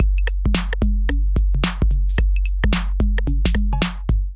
808 Kit groove 110 bpm, Drum Essentials; MIDI Clips; Aristocrat; Ableton

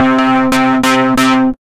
Wavetable, Synth Rhythmic; Instruments; Shorthand Rumblefish; Ableton; Factory Packs; Synth Essentials

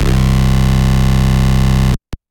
Otto Bott Lead Bass; Ableton; Factory Packs; Chop and Swing

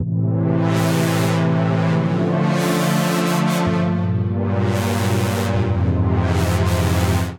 Synth Deep Chord A Minor 130 bpm; MIDI Clips; Tonal; Ableton; Skitter and Step

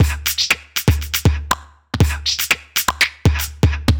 Basic Beat Box Kit, Vocal Percussion; Instruments; Ableton; Factory Packs; Voice Box

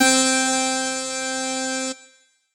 Dirty Funk Lead, Synth Lead; Ableton; Factory Packs; Chop and Swing

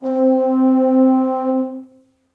Long Hollow - Brass Quartet; Ableton; Spitfire Audio; Individual

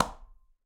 Quinto B Slap Mute; Latin Percussion; Drums; Drum Hits; Conga; Ableton; Factory Packs

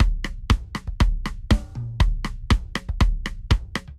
64 Pad Kit Rock; Drum Kits; Ableton; Drum Booth

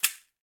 Caxixi Hand; Latin Percussion; Drums; Drum Hits; Shaker; Ableton; Factory Packs

